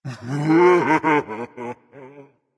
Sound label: boohoo berserker; cuchulainn